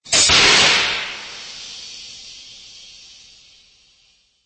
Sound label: blast door